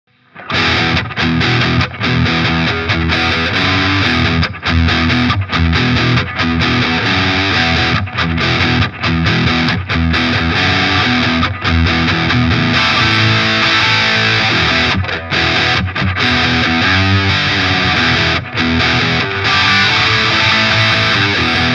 Friedman Small Box Overdrive High Gain full Gain Midscoop